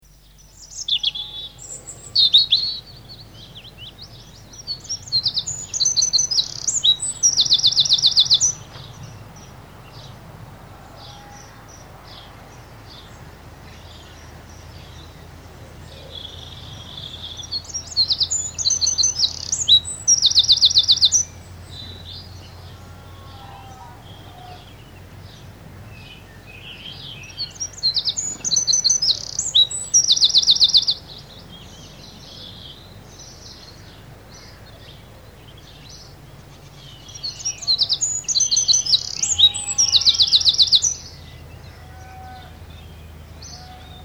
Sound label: Hedgerow Birds - Heckenvögel; Dan Gibson; Songbirds By The Stream